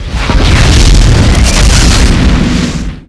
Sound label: Large Exp, Explosions